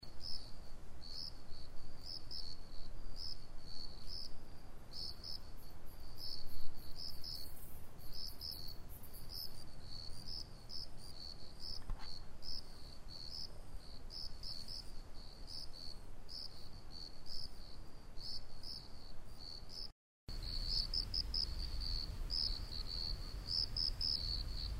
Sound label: koorogi, binaural